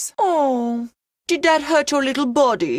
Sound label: aww